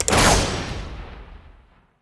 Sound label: famas, weapons; xieshen